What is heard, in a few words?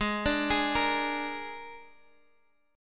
Gsharp Suspended 2nd Flat 5th, Guitar, Half Step Down; G#sus2b5